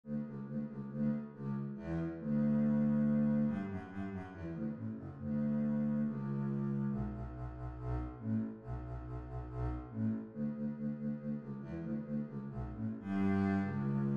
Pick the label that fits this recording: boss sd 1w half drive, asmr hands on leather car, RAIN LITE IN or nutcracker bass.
nutcracker bass